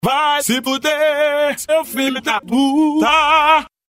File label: bam